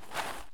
flakes put away; Escape from Tarkov

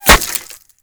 impact wood; weapons; Modern Warfare

